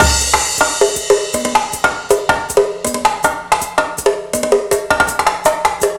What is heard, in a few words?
160, Drum Loops; Total Drum&Bass